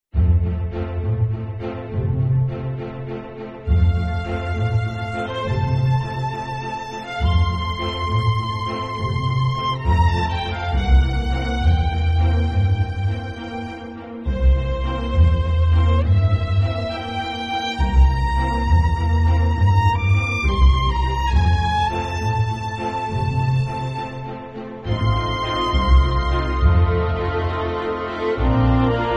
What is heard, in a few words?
learning music